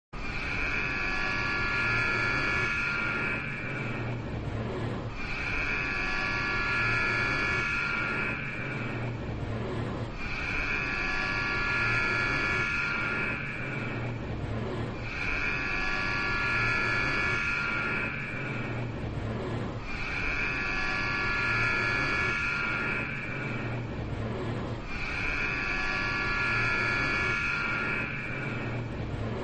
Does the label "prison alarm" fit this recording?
Yes